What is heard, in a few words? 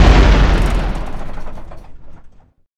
Vehicle Engines; Red Orchestra Ostfront 41-45